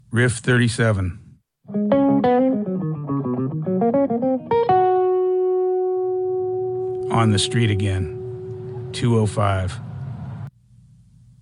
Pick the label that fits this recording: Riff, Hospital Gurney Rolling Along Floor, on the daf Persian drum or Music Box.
Riff